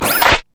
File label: steam golem rocket grab; LoL; blitzcrank